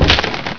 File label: wood, debris; source engine; hl1; video games